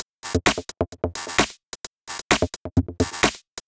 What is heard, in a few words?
Beat 130BPM (Drums No Kick), techno; techno drum fx